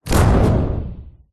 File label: impacts metal heavy slam wallop